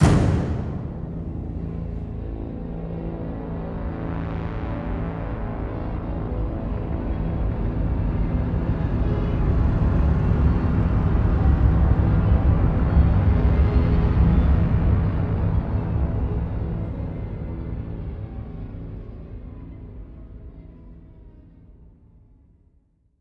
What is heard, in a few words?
Stingers - Tension; Planet Alpha